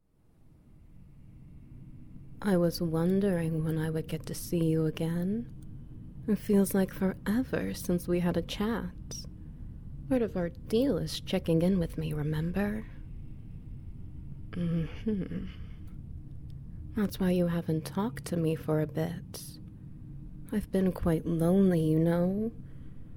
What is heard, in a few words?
Ambience Wet Noises; Keeping You Humble